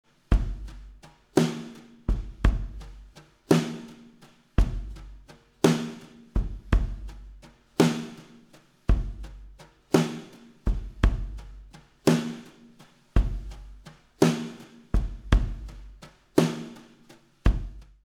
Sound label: Drums; Altiverb